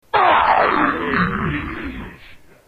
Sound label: zombie death; dod